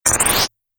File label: Swoosh Electric Metal; Whoosh Sound Effects; Andrew Fleming